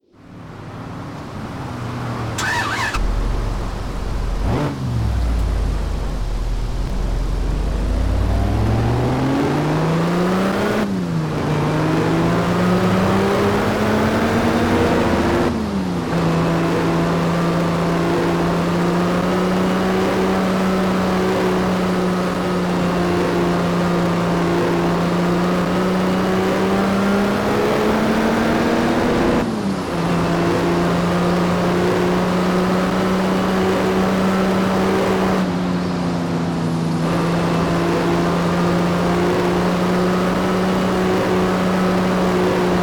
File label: rolls-royce silver spirit; 1990; TDU-1